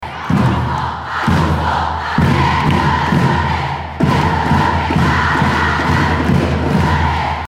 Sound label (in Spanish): Grito Feminista